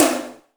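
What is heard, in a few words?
tom drum, left; Best Service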